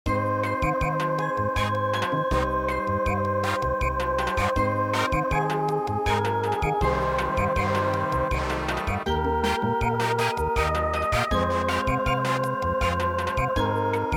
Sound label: Samba; gim gye hwa